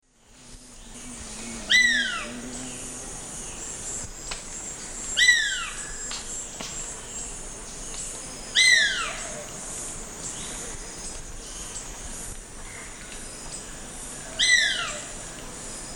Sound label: Geranospiza caerulescens, call; S. America; Eastern Lowlands